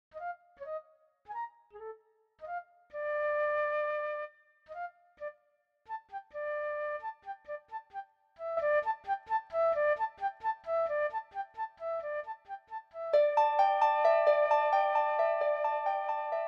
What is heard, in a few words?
Grasshoppers Flute